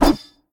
mvt whoosh; smite; gilgamesh

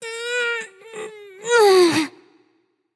grunt lift; smite; aphrodite

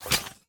zombie step; smite; ahpuch skin10

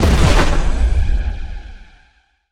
death explode; smite; osiris

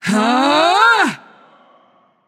battle cry; smite; persephone

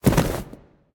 Defeat Bodyfall; smite; izanami

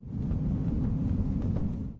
angelic defeat wind; Smite; Thanatos

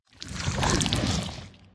slither long; smite; scylla

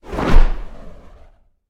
wing; Smite; god Horus

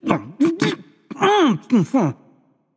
grumble; smite; xbalanque

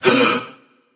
breath

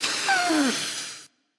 nv breath; smite; xbalanque

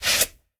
scuff; smite; gilgamesh

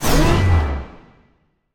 fire; Smite; Zhong Kui

